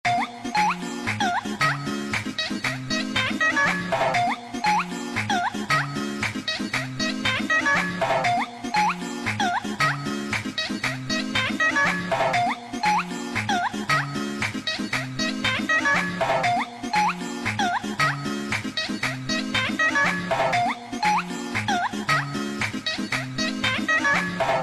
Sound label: dance funk